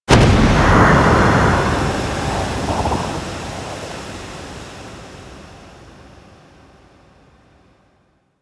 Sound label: Smoke Screen; Warframe; Ninja